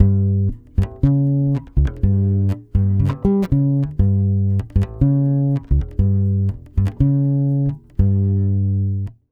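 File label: 120 bass G diminished, brazil bossa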